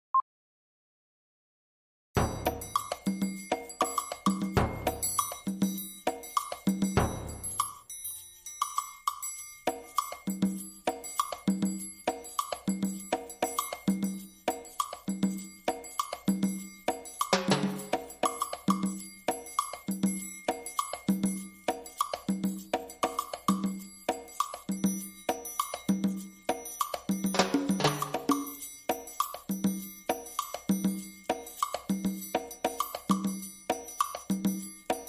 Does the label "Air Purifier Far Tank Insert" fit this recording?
No